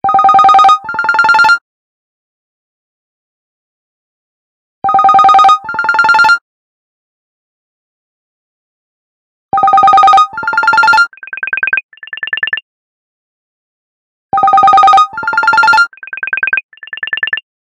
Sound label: Cicada, ringtones; Realme 8